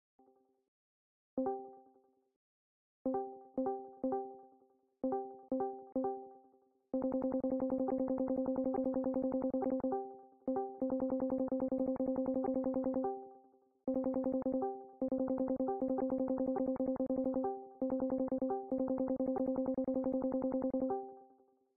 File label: discord join beat